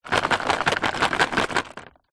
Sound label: household clothes pegs wooden in plastic tub shake